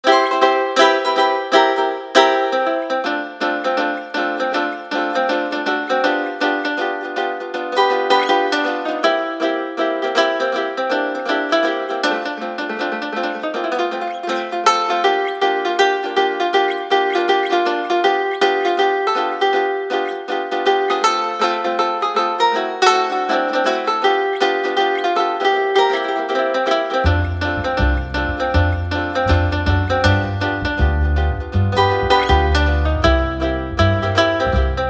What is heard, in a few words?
Ukulele Walk